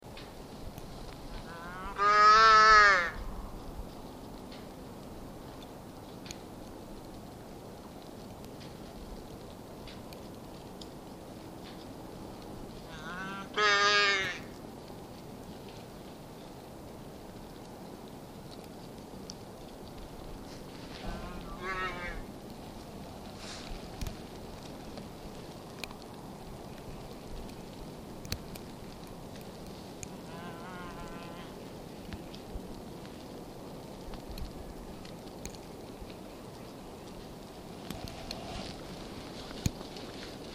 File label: muskox baby